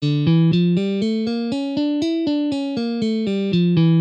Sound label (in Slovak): D harmonicka mol a A ciganska dur cvicenia